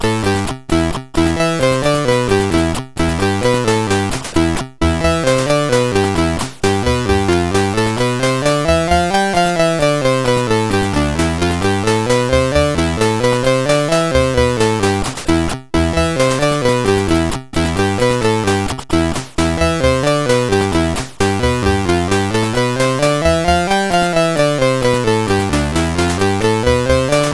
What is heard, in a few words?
remix, sid CSG8580R5